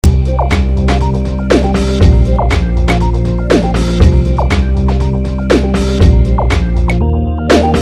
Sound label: Loop